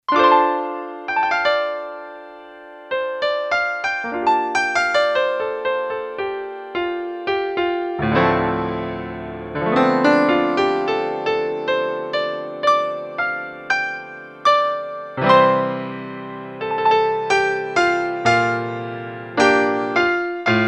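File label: keyboard 7ft Grand; E-mu Proteus FX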